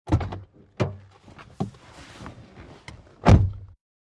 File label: Montero Entering Int, 1998 mitsubishi montero int.; VEHICLES MITSUBISHI MONTERO: INT: Getting into vehicle.